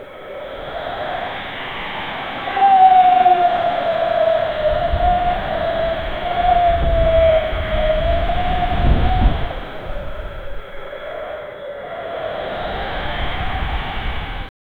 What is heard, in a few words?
wind in the mountains eve